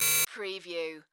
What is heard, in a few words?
Beep Modulated Single; sound-effects-bible